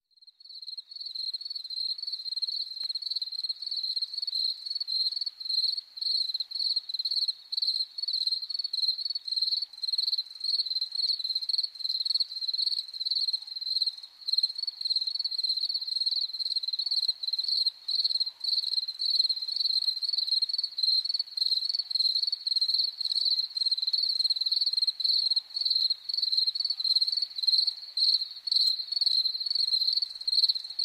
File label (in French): Grillon champetre